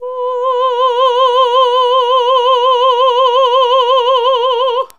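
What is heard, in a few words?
classical female B3, choir; Best Service Gigapack 1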